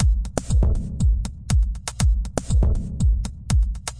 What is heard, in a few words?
industrial deepgate, 120 bpm, drum loops and breaks